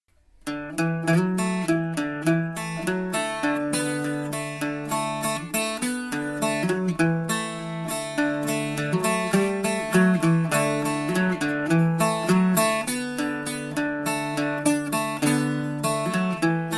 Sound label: melodie bouzouki; down by sally gardens